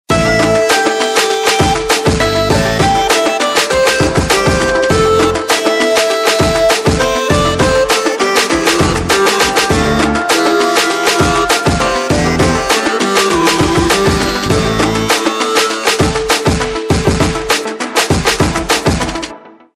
Ringtone; Samsung GT-S3500H

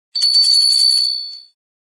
Wind tone; Samsung SGH-P250

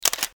Shutter Sound, Event sounds; LG KF900 Prada II

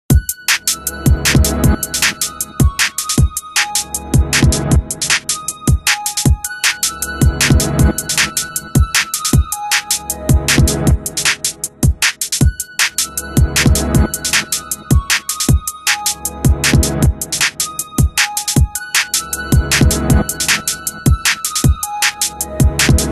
ring, elevate; HTC S740

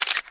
camera click

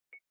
Video Record start; sharp audio

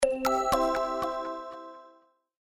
sms; LG Swift L9

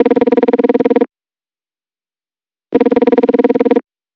pneumatic, ringtones; Nothing Phone (2)

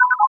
Earjack remove; Samsung SGH-i300

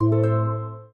pure music up; Oppo A5s

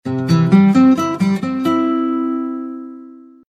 Guitar

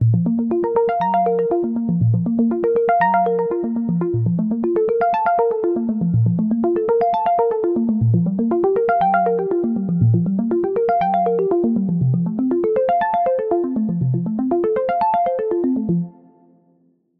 Whale Calls, ringtones; Samsung Galaxy S26 Ultra

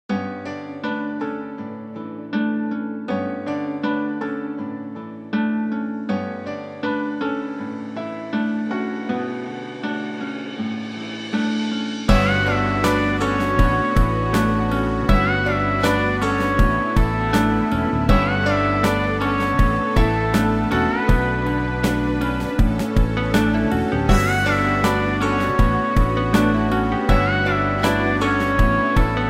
Airy, Nokia Tones

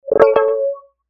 Keyboard, notifications; Tinno Cricket Ovation

